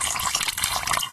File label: water; misao